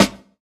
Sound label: clean acoustic snare g key, steel snare drum sound tuned to the G note